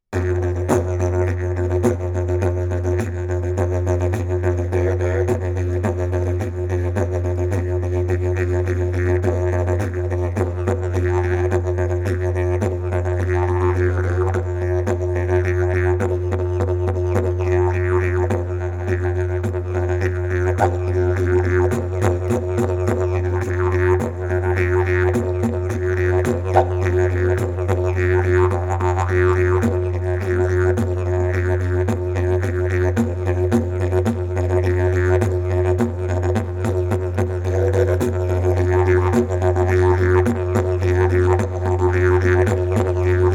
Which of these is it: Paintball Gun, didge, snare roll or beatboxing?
didge